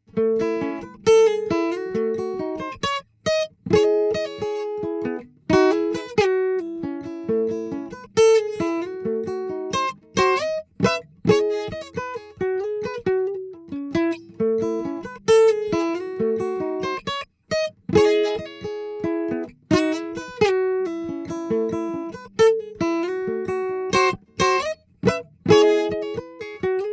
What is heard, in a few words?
Classical Guitar, DPA 4011